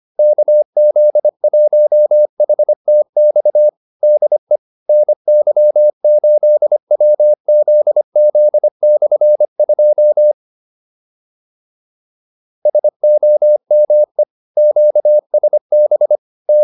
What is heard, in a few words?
QSO, advanced practice files